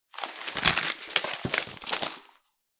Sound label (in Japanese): 新聞紙を広げる音